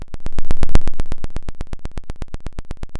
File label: Sound of a thunderstorm, center